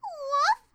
Woof..; Momiji

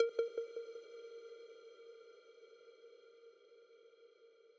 arp

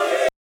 choir

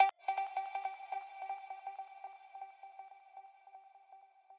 arp

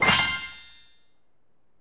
CARD PASS UP INFO; maimai

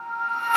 musicbox

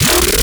bass purge

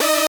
synth

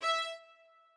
strings

